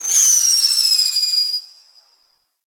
Spam Projectile Whizz; Warframe; Teralyst; Ropalolyst; Abilities

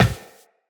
Run Glass, Footsteps; Characters; Tenno

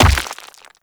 Brawler Rock Elemental Footstep Default; Warframe; Warframes

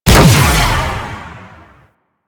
Missile Swarm Fire; Warframe; Corpus; Corpus Railjack; Spec Ops Arachnoid

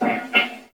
Wolf Breath; Warframe; Duviri; Animals

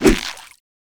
Water Balloon Fire

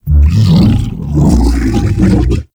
Helminth Feed React Unhappy; Liset Alchemy Lab

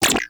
Shield Impact Default; Volt

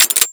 Air Pistol Mech, Weapons; Crp Air Pistol

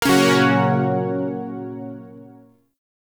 Shawzin Hirajoshi Chord; Warframe; Zariman Shawzin

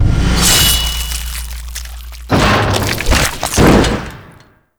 Assassination, Finishers

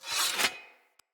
Sphere Dual Sword Unequip, Weapons, Swords; Player

